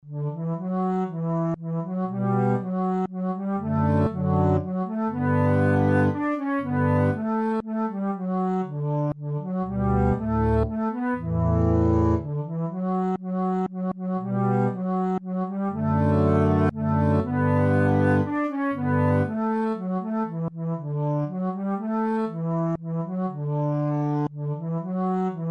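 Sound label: waltz, Dulcimer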